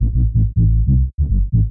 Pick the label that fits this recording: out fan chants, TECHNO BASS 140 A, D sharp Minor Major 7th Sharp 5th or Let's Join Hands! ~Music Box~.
TECHNO BASS 140 A